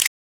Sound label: camera quick; NEC Casio MEDIAS-ES-N-05D